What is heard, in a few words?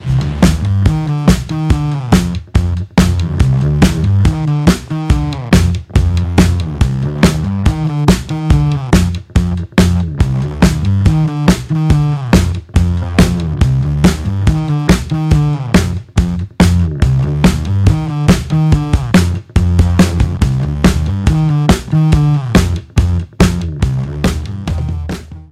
bx console Focusrite SC mix buss wet